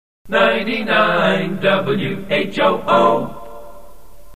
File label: accapella jingle